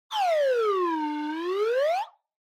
Slide Whistle Phrase

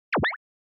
Onoma-Button-Click (Dry)

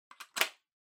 Power Strip Hand Hard Plug In

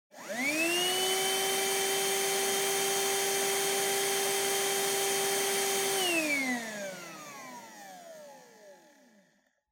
Vacuum Cleaner Vacuum; 掃除機01-01(バキューム)

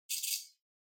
Fluorescent Light Noise Far Spark

